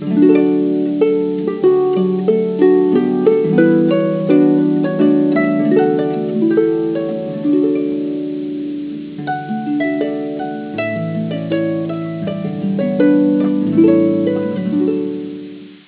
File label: harp